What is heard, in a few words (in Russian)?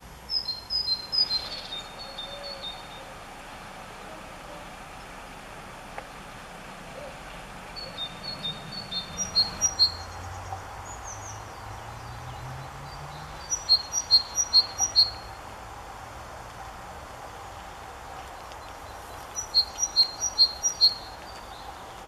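немецкая запись поющего самца, синицы; kolmayze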